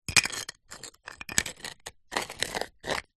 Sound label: nature ice pieces thick movement rub together